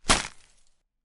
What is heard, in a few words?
limb blunt; Damage; Barotrauma; Subsurface